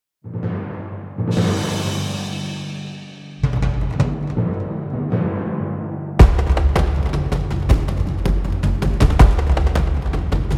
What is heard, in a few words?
Big Drum Time